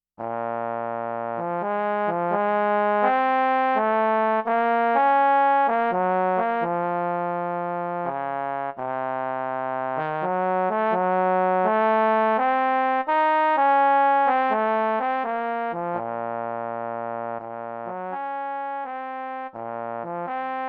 Trombone Lyrical

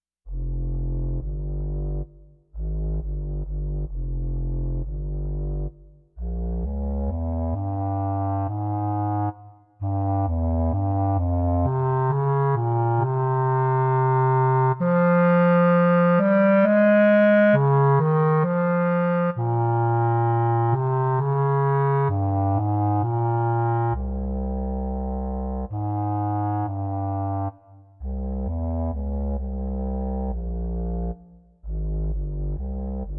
Contra Clarinet Lyrical

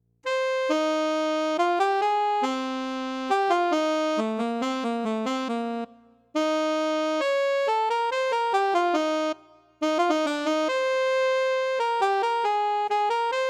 Alto Saxophone Lyrical

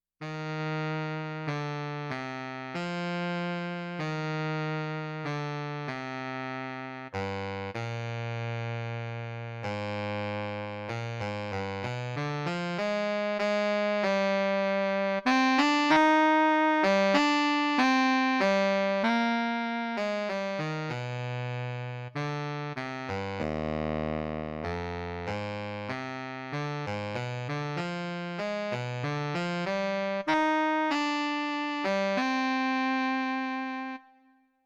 Baritone Saxophone Lyrical

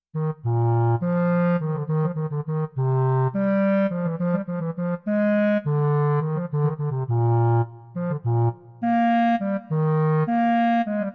Bass Clarinet Technical

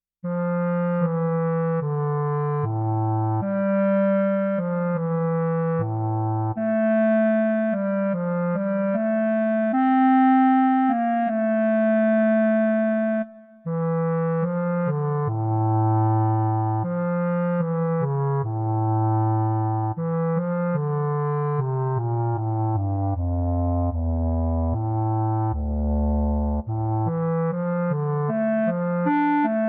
Bass Clarinet Lyrical

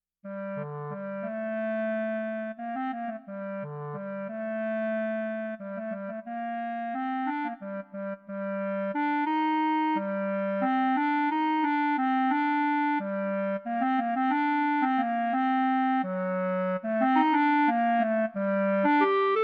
Bb Clarinet Lyrical

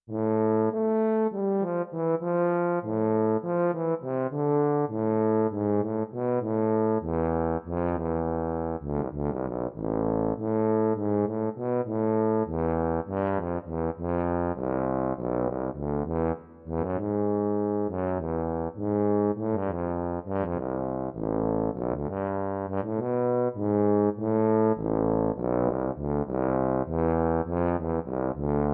Tuba Technical

